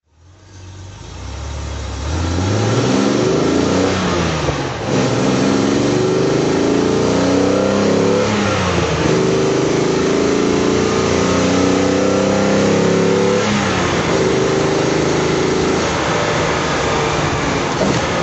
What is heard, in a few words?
mercedes 300sl drive